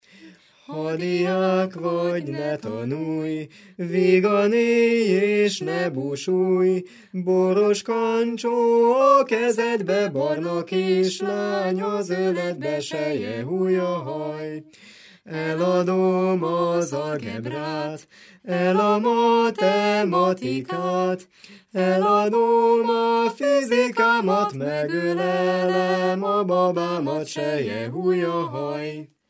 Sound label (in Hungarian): nepdal; ha diák vagy ne tanulj